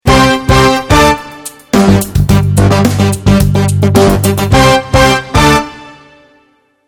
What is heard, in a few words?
funky logo fat